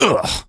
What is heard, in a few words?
dude augh; Postal Dude Voice Lines